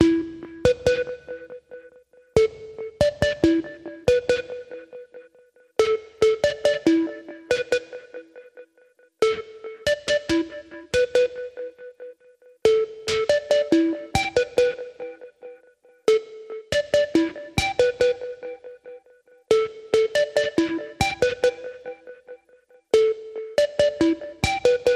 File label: Bored Computer